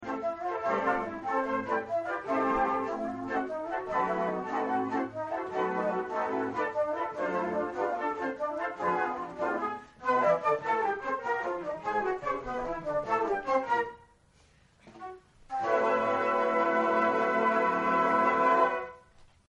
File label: concert, flute; AUTUMN concert 2016